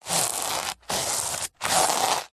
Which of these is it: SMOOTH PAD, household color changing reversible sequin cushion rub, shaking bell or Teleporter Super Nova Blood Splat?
household color changing reversible sequin cushion rub